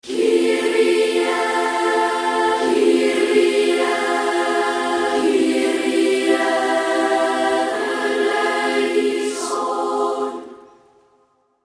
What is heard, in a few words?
Church Choir Singing Kyrie Eleison religious 91 D sharp; acapella